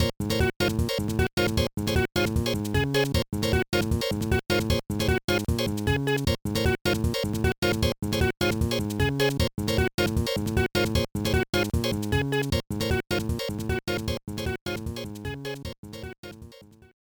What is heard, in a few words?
Big Boo Last hit; Mario Pinball Land